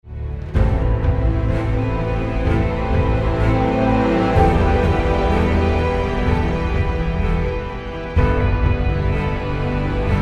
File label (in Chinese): 专题片背景音乐